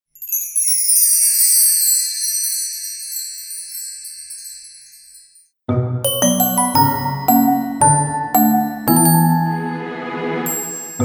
Comic, Comic Slow

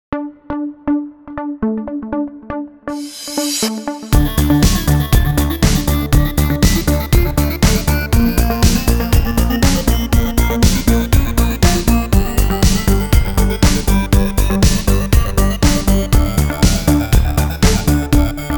European Electronic; EDM 리듬

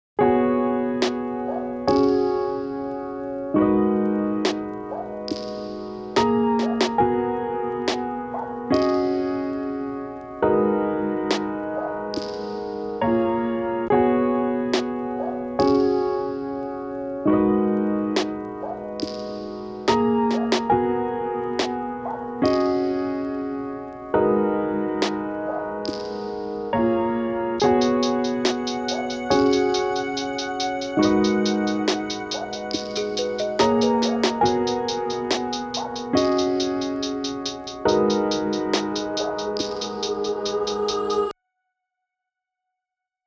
Lofi Mood; DQ BG 자연다큐

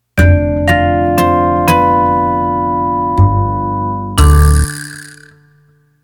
ORCH FX

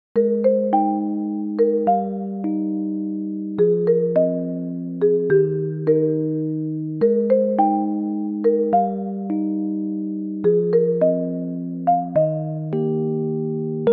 New age; 잔잔

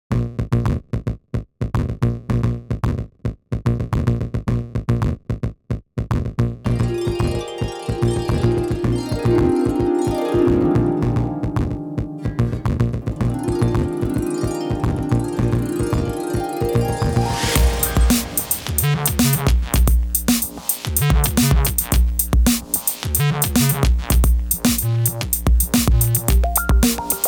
Sad Harp; 라틴

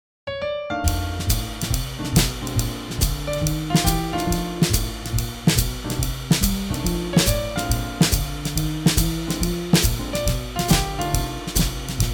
Blues

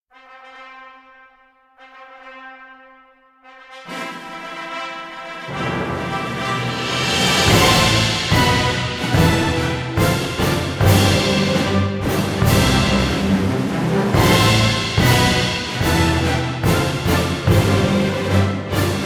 Wedding March Orch; Mendelssohn

